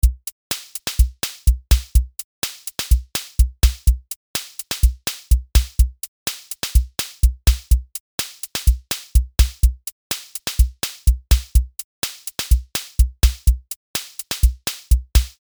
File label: rock